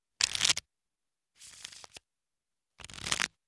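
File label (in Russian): пересчет и расклад карт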